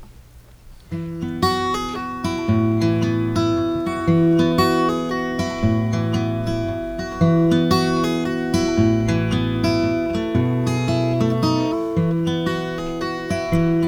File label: Gibson guitar j45